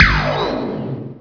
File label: PED POWERUP